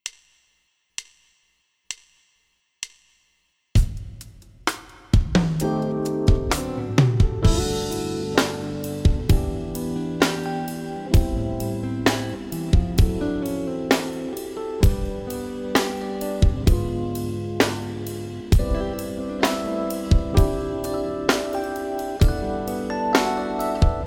Jazz BASS Play Along SMOOTH BALLAD

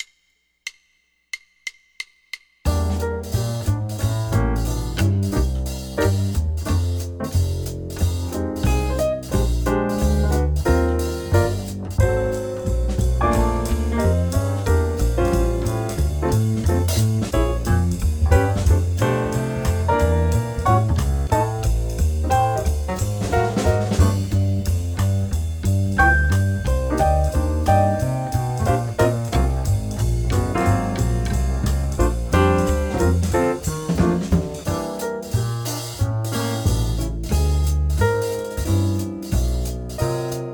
swing guitar play along, jazz; LMEA All-State Jazz

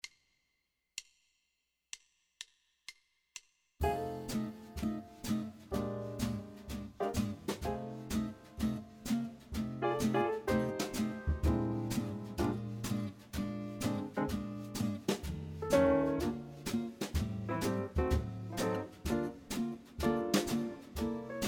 Jazz BASS Play Along F Blues; LMEA All-State Jazz

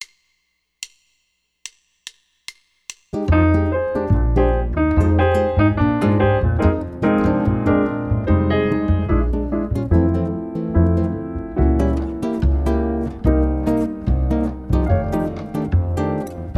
bossa no drums, jazz; LMEA All-State Jazz

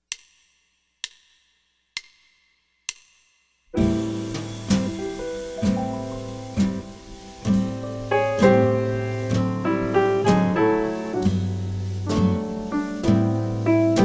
Jazz Ballad Bass Play Along; LMEA All-State Jazz

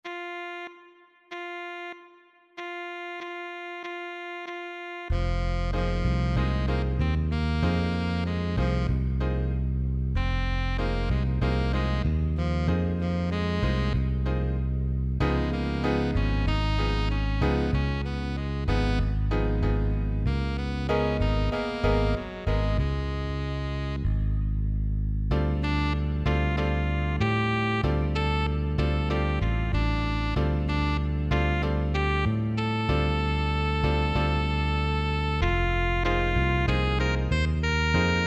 play along drums

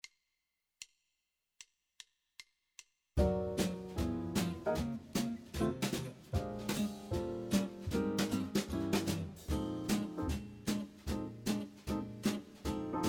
bass play along, medium swing, jazz; LMEA All-State Jazz

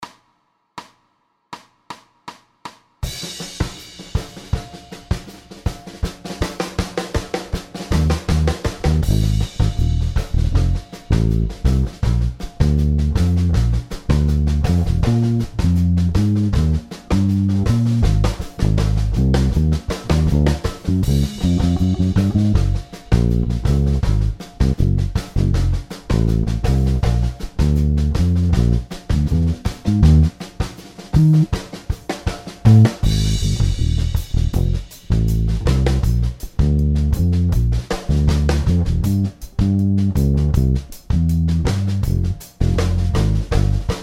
piano guitar play along, alt style, jazz

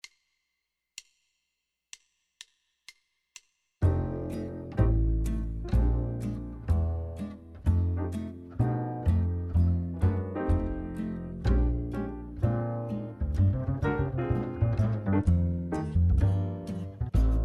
Jazz DRUMS Play Along F Blues; LMEA All-State Jazz

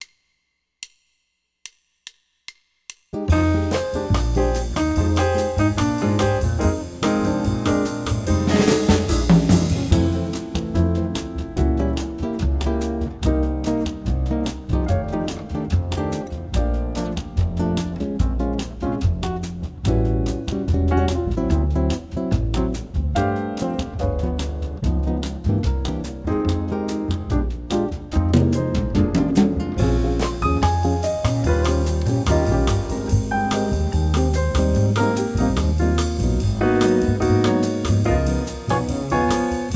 all horns bossa, jazz; LMEA All-State Jazz

